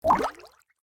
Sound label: ripple touchdown, LG G3